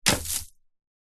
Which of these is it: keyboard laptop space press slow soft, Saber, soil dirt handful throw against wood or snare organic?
soil dirt handful throw against wood